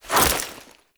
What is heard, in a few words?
Bayonet Melee Whoosh Gear Accent; Warframe; Tenno